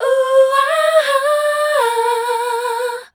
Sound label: MEL C2-L, Voice Spectral